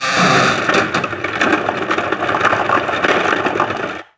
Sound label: cart loop; Cars; XBOX